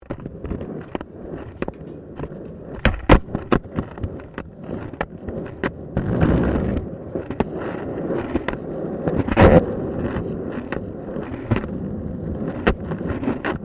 SKATEBOARD UNDERSIDE CONCRETE; Skateboard sounds; Skateboarding; Sports

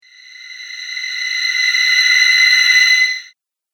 Tension Of Terror, evil sound; horror

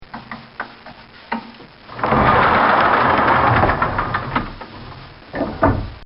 Sliding Stall Door Closed

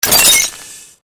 Created Magic Spell Ice Bolt Impact; Series of created spell sounds

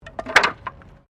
Wood Move

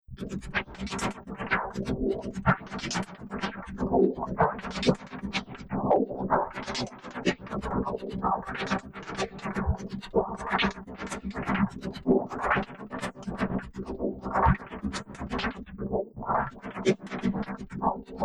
125 Bpm Loop Cyborg Glitch Patrol, Robot

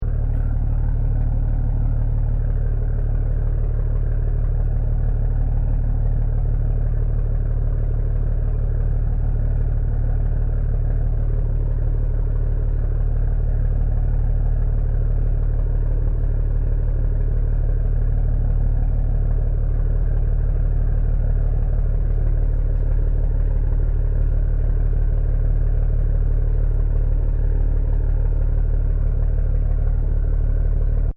Boat Tourist Ferry Idling; Boats (Sound Effects:Vehicles)

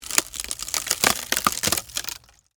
Impact Wood Crack, Splinter; Materials; Blast Wave

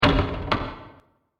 Hit And Whack Double Impact; Miscellaneous Impact; Andrew Fleming

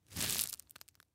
Rope Twist Tighten, Foley Rope Twist Tighten Knot; Rodeo Sound Effects

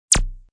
Lazer Sound; Laser Sound

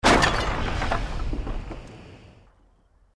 EXPLOSION MEDIUM WOOD

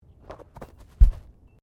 Body movement Foot Foley; Body movement foley to simulate falls and deaths; Footsteps; Human

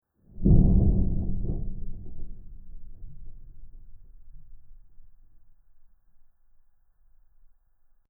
Deep Metal Underwater Boom Impact Horror; Sound Design; Tom Hutchings

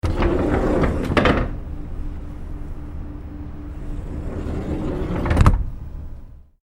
Sliding Door; Various close slides open and close - internal large door

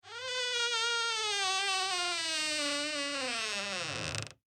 Horror Door Squeak Long Creak; Creepy Doors (Sound Effects:Horror)

